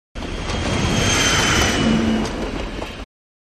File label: Diesel Loco Tight, bys